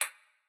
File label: Bullet Bounce